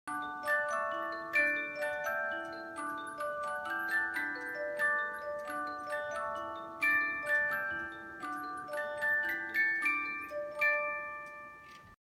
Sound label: Edelweiss, cuckoo clock; 638t kissing couple cuckoo clock